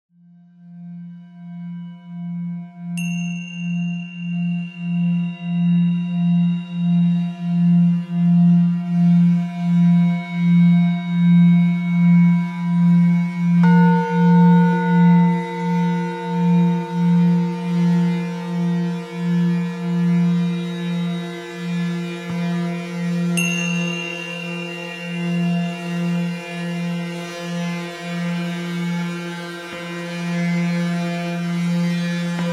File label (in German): leuchtender Weltenklang, Klangwellen; Aufnahmestudio: Beatonal Tonstudio Kurt Eisfeld Weingarten/Baden